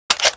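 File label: magazine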